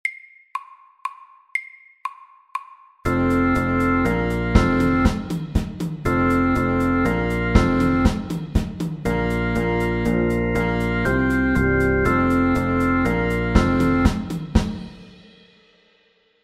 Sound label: Fusion